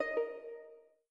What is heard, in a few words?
Windows Xp Notify